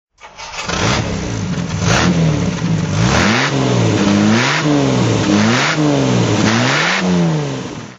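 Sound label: jaguar xk120 throttle; Jaguar XK 120 3.4 Litre DHC, 1954; Engine sound; V3D/ ClassicarImages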